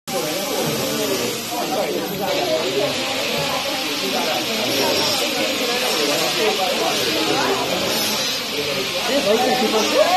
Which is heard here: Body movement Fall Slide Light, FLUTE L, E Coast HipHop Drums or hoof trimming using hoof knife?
hoof trimming using hoof knife